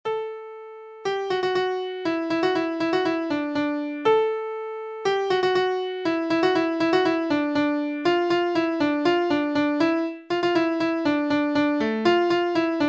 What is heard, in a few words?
Dumbala dumba midi; SMA